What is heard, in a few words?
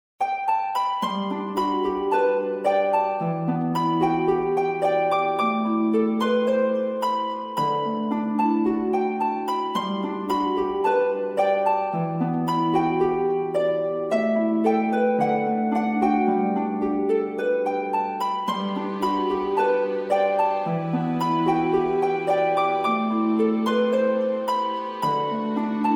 healing; 木漏れ日：ヒーリング08; maoudamashii